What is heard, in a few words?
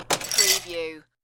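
Ice In Glass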